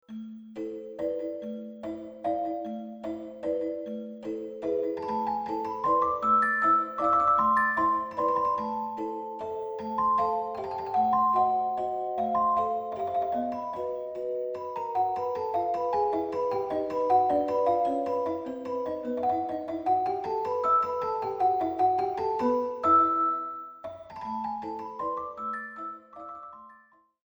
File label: celesta